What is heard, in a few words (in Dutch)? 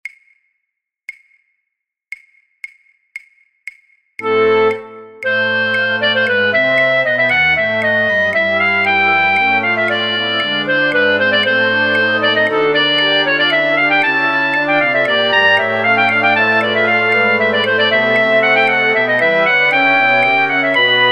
fluitorkest, Fluit; voor oefentracks; Matthaus Passion